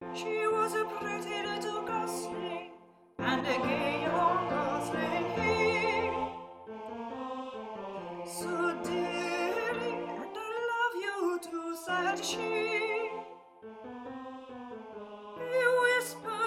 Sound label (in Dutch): SOPRAAN